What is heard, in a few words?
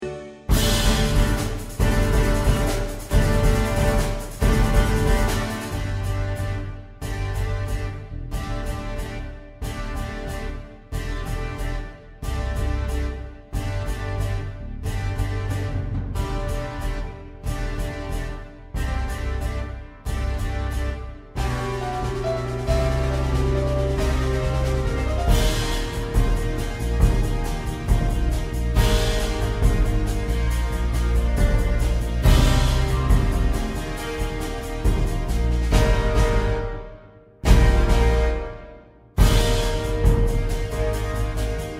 instrumental